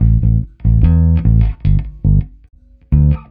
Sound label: Reggaeish, Rock Bass; ACID MUSIC